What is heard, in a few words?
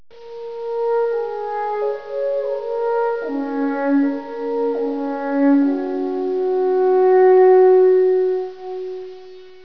alphorn; swiss music